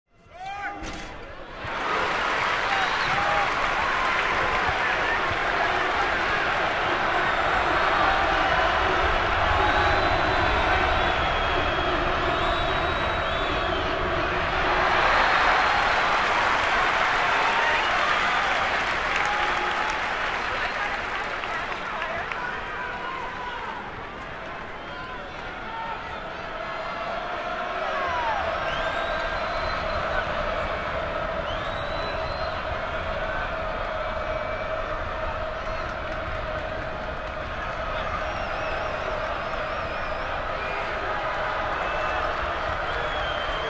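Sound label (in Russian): баскетбол кидание мяча в корзину овации болельщиков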